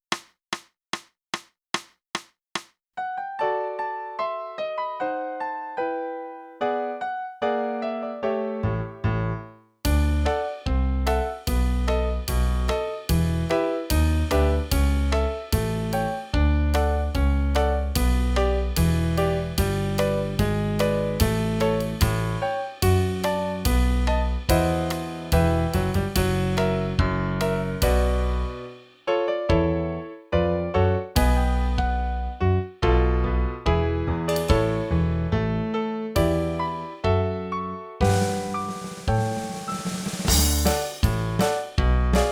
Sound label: flute; (Morgan) - Boosey Woodwind Method: Flute (Book 2)